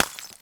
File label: ice light collision; Angry Birds